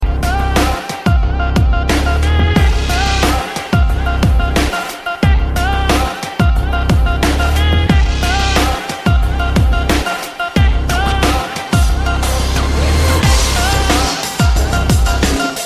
Remix

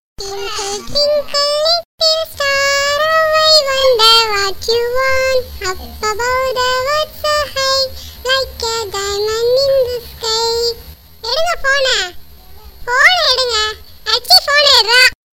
child

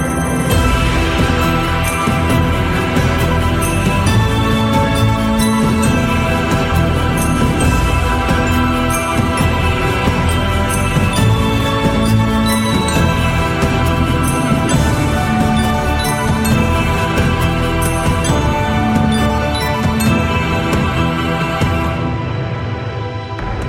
western ringtone

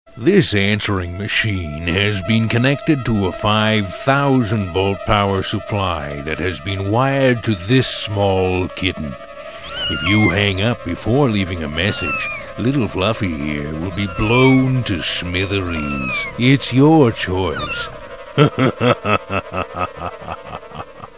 kitty; sms; animals

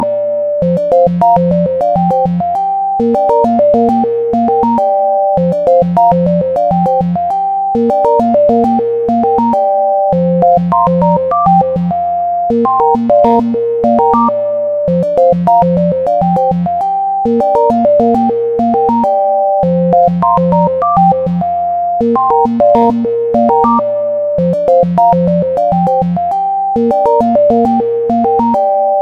Phone Melody

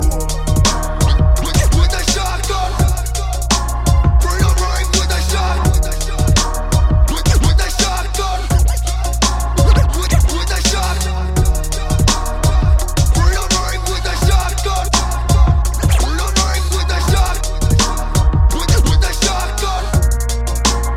scratch

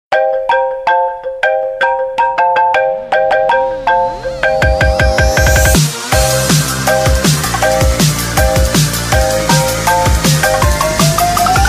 Xylophone Drum

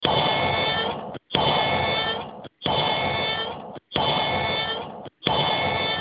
i phone 6 Alarm

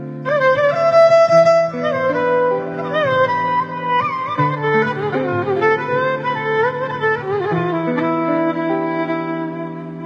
Turkish Sad Violin

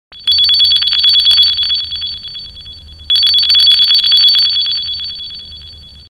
3d business ring

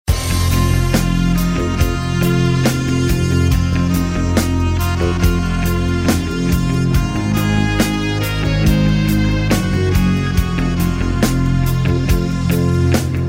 pop

